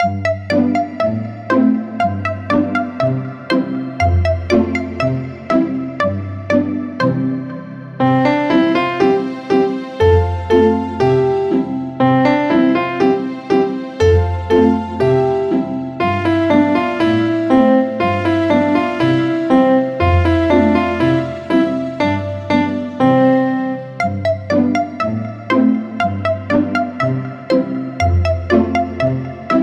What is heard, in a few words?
karaoke